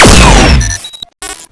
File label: heavy Plasma; blobAndConquer; combat